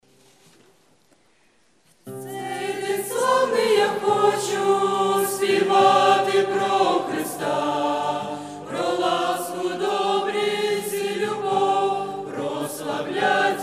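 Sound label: penie na ukrainskom yazike, singing in Ukrainian; Молодежный лагерь - Апшеронск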